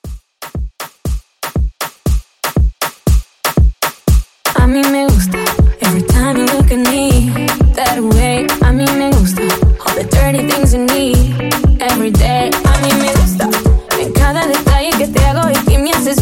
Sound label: Afrobeat Re Drum 119